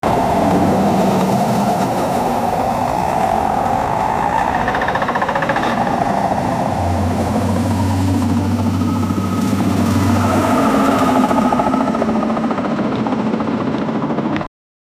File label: the sound of tractors and, agriculture